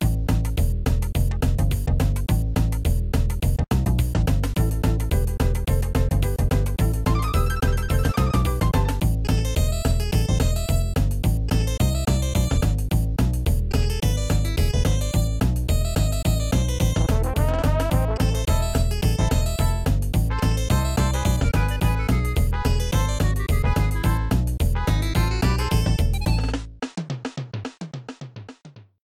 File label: Swing; DKC SNES; DK Island